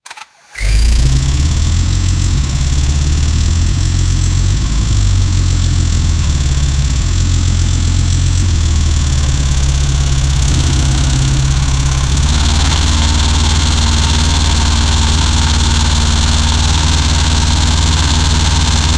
um motor v18 com sistema

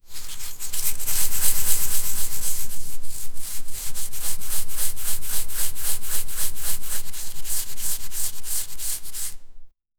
som de escova a escovar, brush

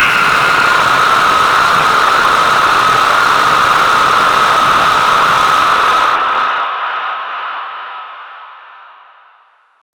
efeito de medo distorcido; horror

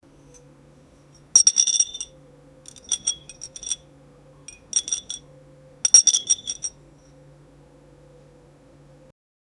um efeito sonoro cinemtic

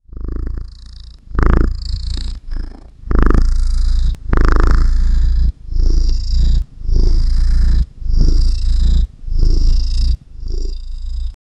um ronronado robotico de, robot

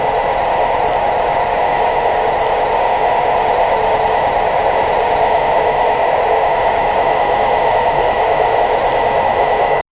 trilho de um trem loop, train

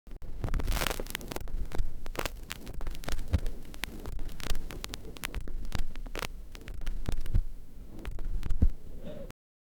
sons de disco de vinil; vinyl

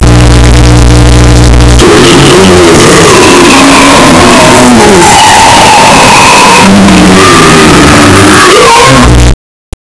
homem se transformando em, breathing

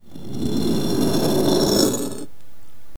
o som de uma estatua, stone

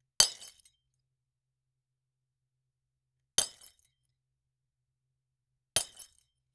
cortando um objeto de vid; cutting

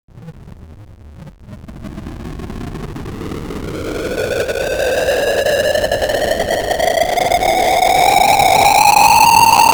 som de lava subindo em

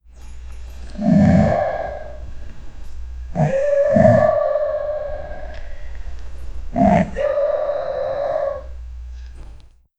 efeito sonoro de cachorro

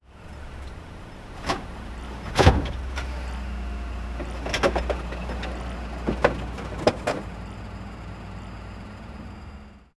pegando uma caixa de um, truck

